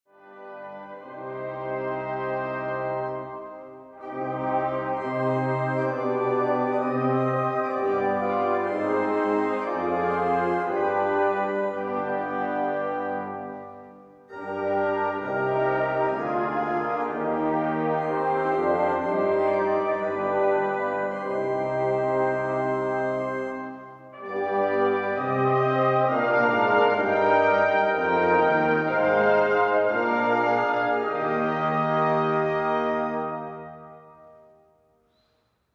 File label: Bach Choral